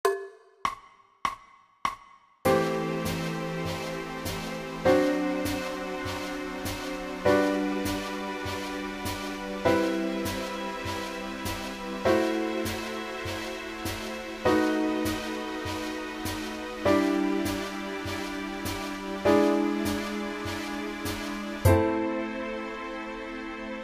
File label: bass; change Root Notes